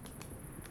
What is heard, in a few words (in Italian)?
applauso